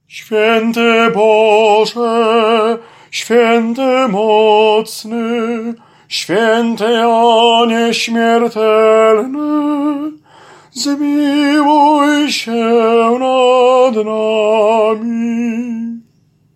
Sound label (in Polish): TENOR; Swiety Boze